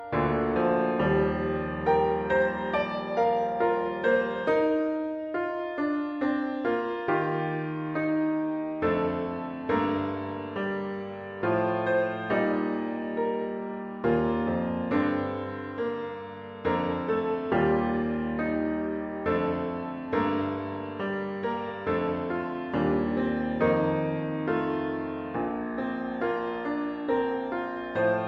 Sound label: piano only